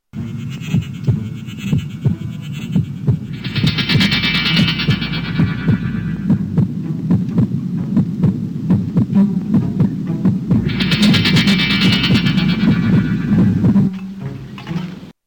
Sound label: Bionic run